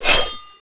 machete slash

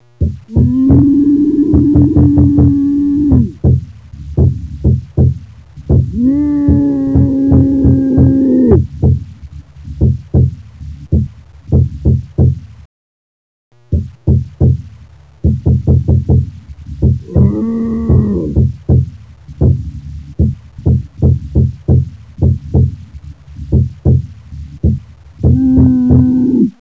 door scream

